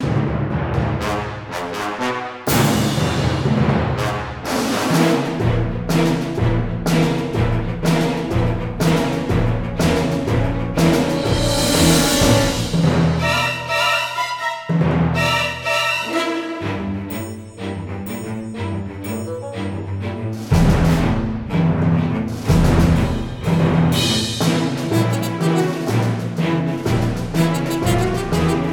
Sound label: Music Boss Battle; Costume Quest 2